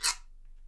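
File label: guiro, Percussion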